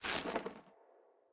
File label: gugnir feathers; smite; odin